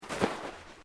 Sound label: Footsteps Boots Sand Deep Step; Footstep Foley; Human